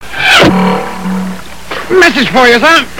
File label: Message